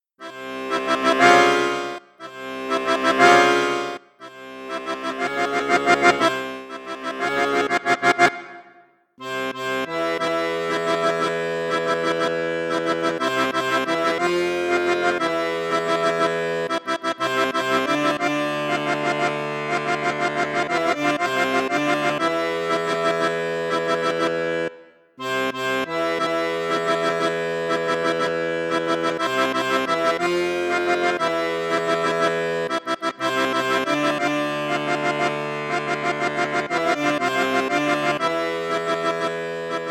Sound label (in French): registre basson flute juste, crucianelli accordeon